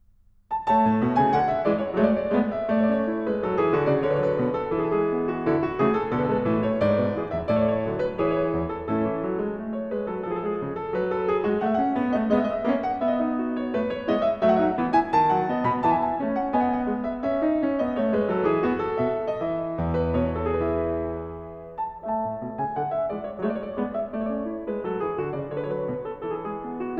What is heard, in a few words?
English Suite in A Major, Courante; English Suite No. 1; BWV 806; Bach